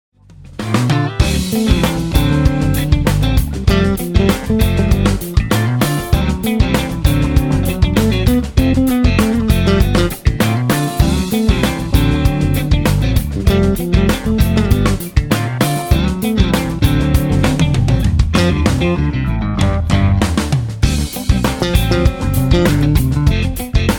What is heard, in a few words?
Funky Twist